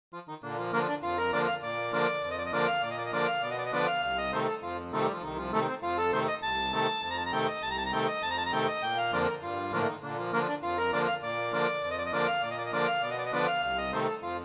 clarinet polka, accordion

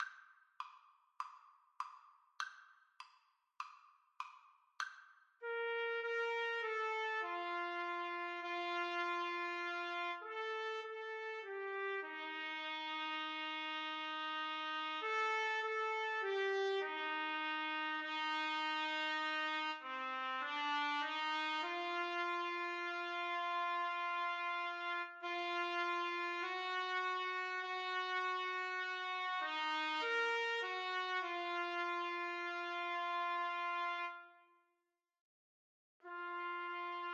o sole mio TPTs

